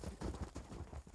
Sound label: horseman walk; propack